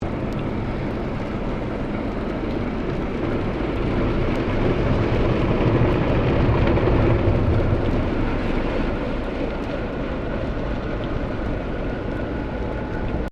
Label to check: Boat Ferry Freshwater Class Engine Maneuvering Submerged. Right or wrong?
Right